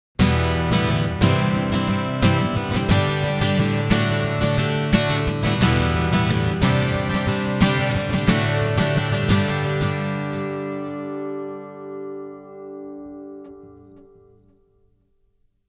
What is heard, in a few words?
Boss Resonance EQ Comp Delay